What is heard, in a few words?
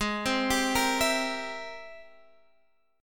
G sharp Augmented Major 9th G sharp Guitar DADGAD